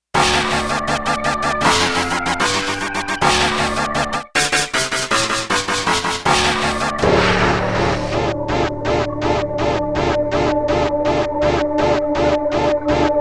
Gated FX

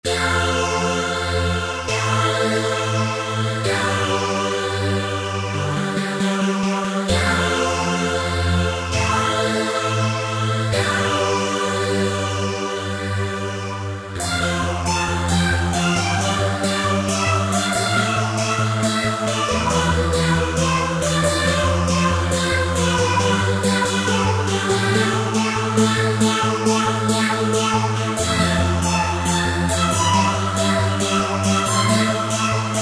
Talkin Saw, Roland V-Synth; Factory Patches

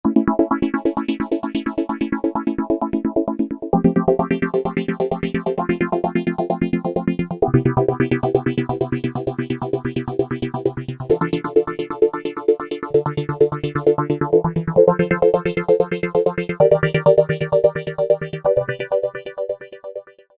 Korg microKORG Rhythmic Pad; Factory Voices

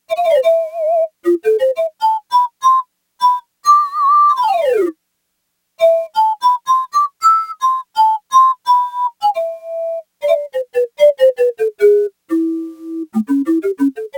Pan Floot, Yamaha TX802; Factory Patches; Voices

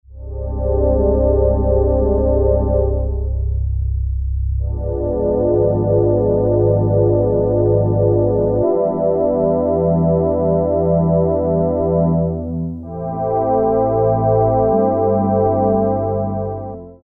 Pad; Factory A

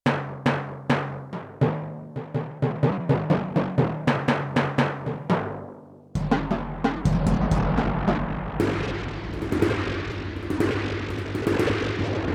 Modulation, Timpani & Gong, Yamaha TX16W

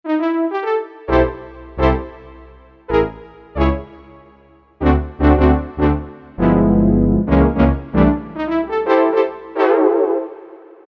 Soft Horns, Korg M1; Factory preset examples

